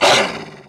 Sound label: wolf attack; mir2; crystal